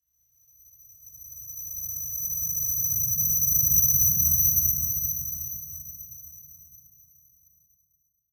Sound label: Ring High Harsh Passing Tones Like Ear Ringing - Bowed Chime; Hollywood Edge; sound designer's toolkit v2